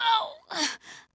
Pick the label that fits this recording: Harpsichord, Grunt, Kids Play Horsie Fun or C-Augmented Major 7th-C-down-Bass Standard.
Grunt